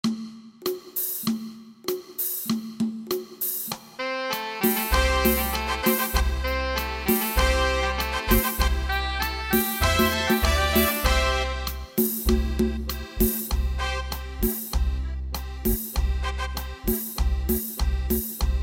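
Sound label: Klong Yao C major, Thailand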